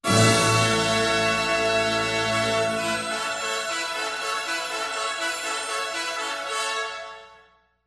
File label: Ship victory, MUSIC; Pirates Of The Caribbean